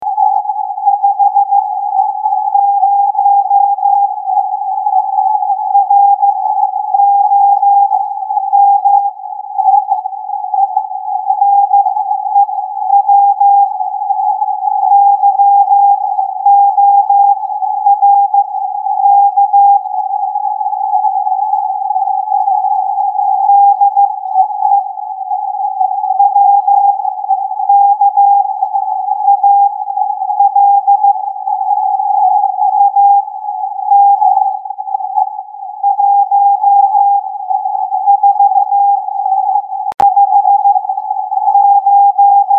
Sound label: CW DX test; wkal rome ny